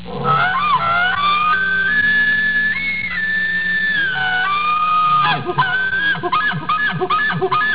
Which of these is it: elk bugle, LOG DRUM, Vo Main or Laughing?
elk bugle